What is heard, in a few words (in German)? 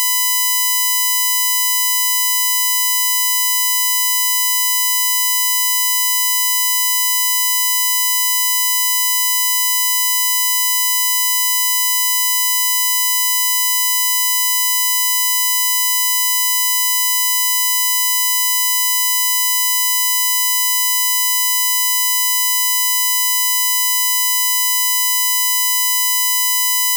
1000Hz mit Obertoenen